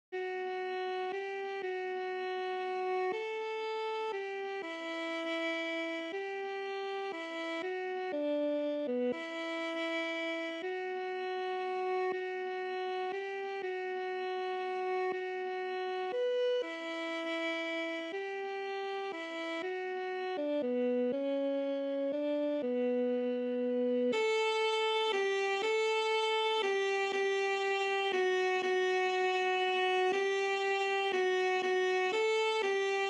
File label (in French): Violon